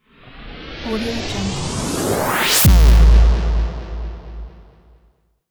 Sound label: low swoosh sci-fi